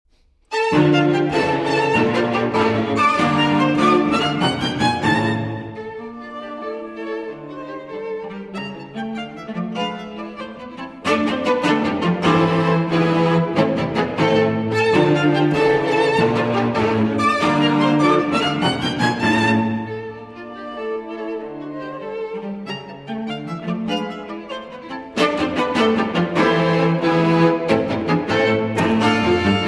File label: String Quartet in D Minor, III. Scherzo